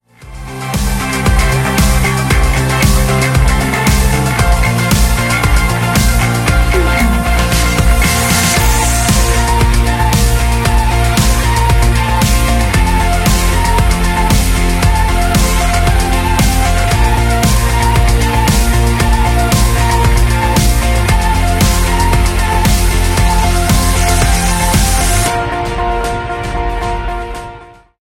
Tone; Movistar

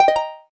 gayageum zero, keytone

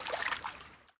ocean flicking; LG G Flex

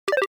Keytone soft; LG SU780 Lollipop 3

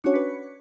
key menu up down, keytone; Samsung SGH-T369 Linx

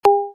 msg Notify In compose; Meizu MX2

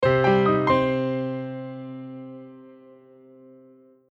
Notification

